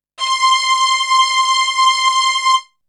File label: Strings; ZERO G - Datafile DISC 3